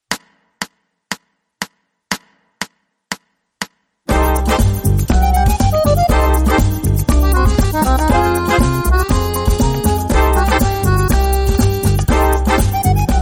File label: Latin Fast